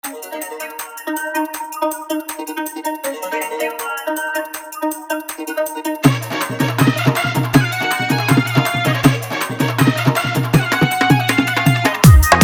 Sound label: Full Dhollki Bass Dance Mix